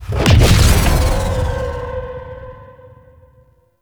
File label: Reinforcement Notifier Wide; Fallen From Heaven